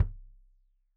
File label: Kik Stereo; Deeply Sampled Drums; SMDrum